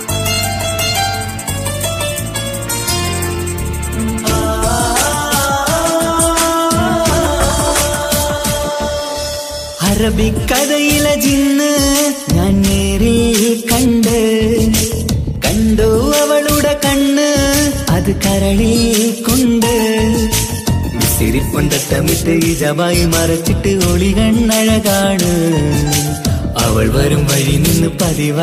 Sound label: malayalam music ringtone